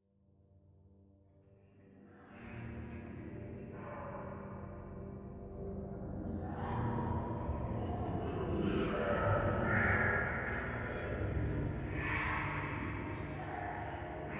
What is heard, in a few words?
music - mystery - ambient mood; You Are Empty